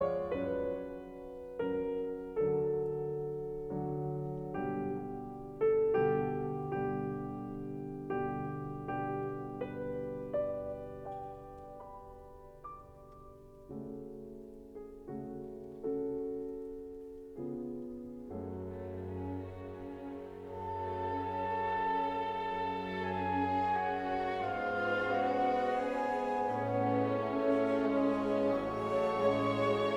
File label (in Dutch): Romance pianoconcert D minor; Mozart; K466